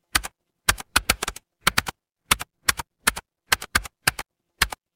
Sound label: office keyboard